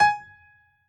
piano hi

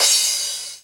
crash

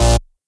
bass

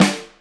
Drum Rpd Fast

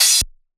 crash cut

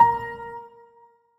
piano; osu

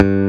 bass

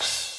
crash; osu; adofai

